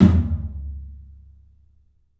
TOM, 3D Drums, CLUB TOM; 3D Sound; CLUB 14TOM

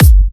Electro Kicks

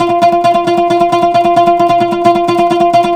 PLK OUD, Roland LA Composer

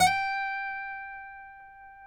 FINGER, Guitars; TAKA

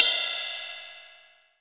RIDE, DRY KIT; AKAI S6000 CD-ROM - Volume 1; DRUM RYTHM

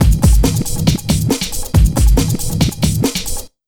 Big Beat Drumloops, 138; USB Soundscan vol.25; AKAI; MOVE 138

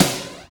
SNR FLANG, Snares; Roland - Rhythm Section; Snares 5

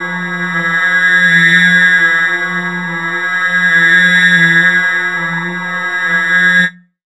synth, f, Chillout Synth Atmos; Synth Atmos 4

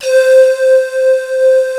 PIPES, D - 70; Rubber Chicken Software Sample Bank

